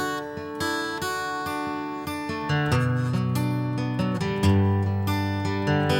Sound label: acoustic